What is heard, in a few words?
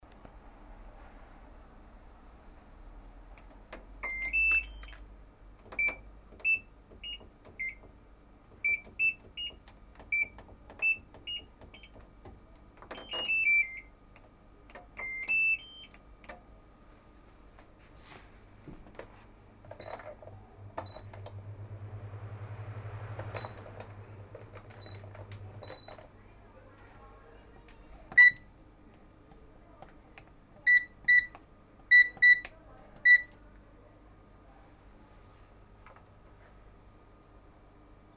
buzzer